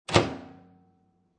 Microwave Door Close Modern, Household, Microwave, Door Close, Modern Microwave; Kitchen